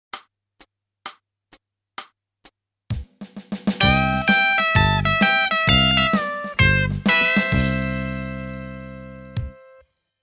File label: country